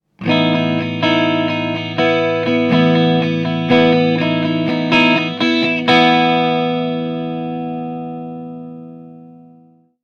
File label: Acoustic, ringtones; Marshall; London KB 1501